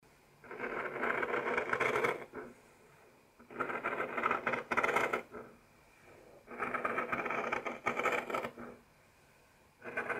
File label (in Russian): voilok nojnicami